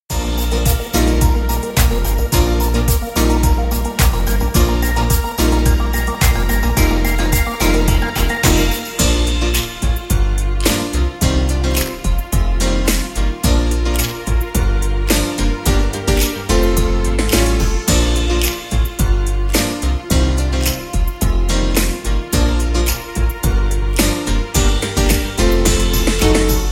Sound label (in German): Klatschlied Instrumental